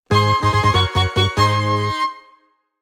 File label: Jingle Get Item; Pokemon Masters EX